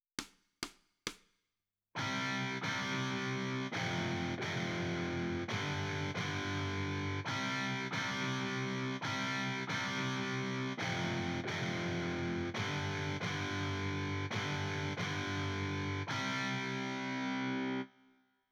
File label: Guitar Right